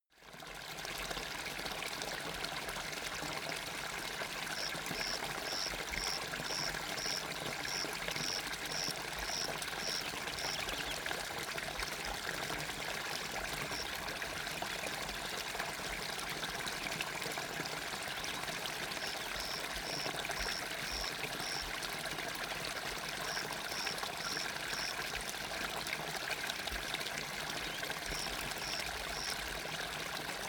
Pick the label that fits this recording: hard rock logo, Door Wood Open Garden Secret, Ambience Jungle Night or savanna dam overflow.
savanna dam overflow